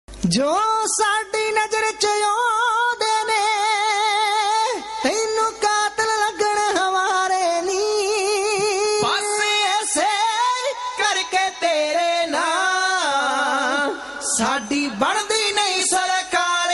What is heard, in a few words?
punjabi song